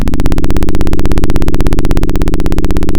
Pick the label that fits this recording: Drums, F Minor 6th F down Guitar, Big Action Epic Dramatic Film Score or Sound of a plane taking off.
Sound of a plane taking off